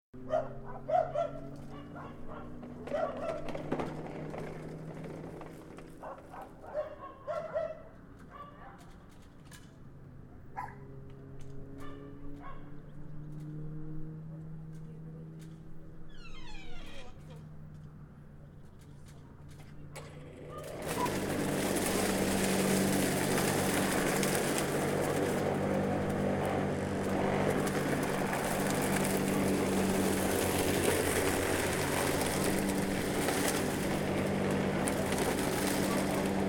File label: Garden City Life, Ambient noises of a garden in the city. Some dogs barking; Lawn mower starts and runs for a while; City Sound Effects; LynneMusic